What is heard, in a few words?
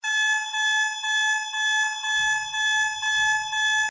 Fire alarm